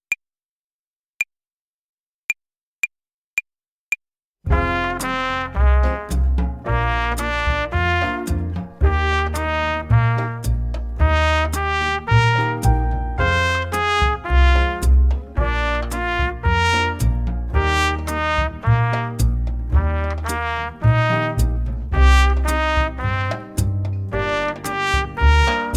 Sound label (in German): Akkordzerlegungen Übung 5, Play-along Slow, Trompete; Jazz Club